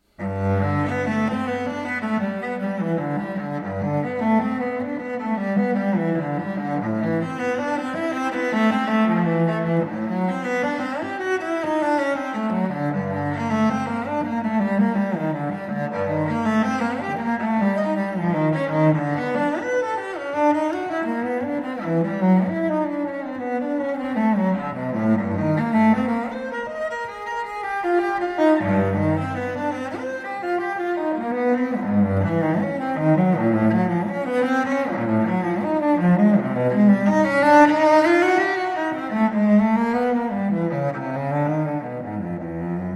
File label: Hohe Schule des Violoncello-Spiels (High School of Cello Playing) Etude; Op. 73; Etude No. 2 in G Major